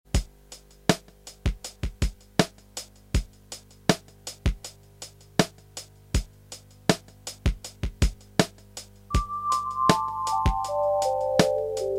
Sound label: Played entirely on the RS370 with the exception of the drum track